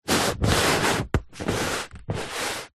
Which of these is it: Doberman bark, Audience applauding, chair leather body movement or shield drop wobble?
chair leather body movement